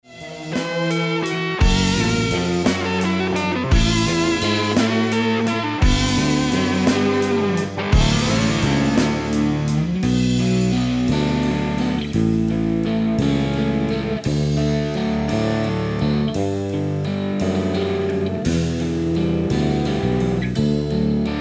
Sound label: blues